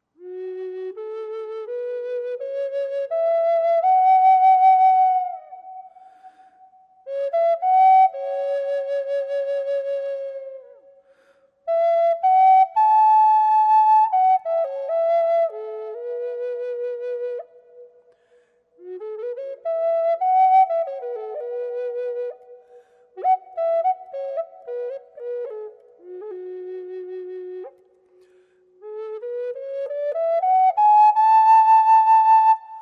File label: Rainbow Flute, tiger maple; standard flutes